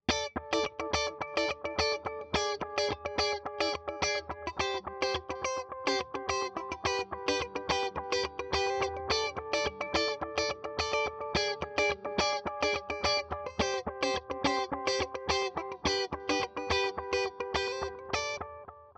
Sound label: Bucket Brigade